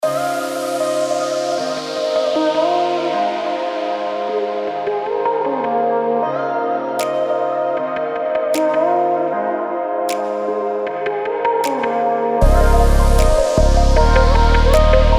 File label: Melodic Trap